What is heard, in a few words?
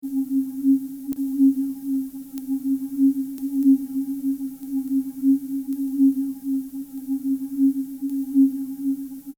pad etereo